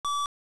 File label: Low Health; Zelda; AOL